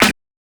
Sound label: heavy clap snare; snares